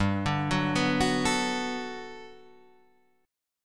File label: G Major 9th, G, down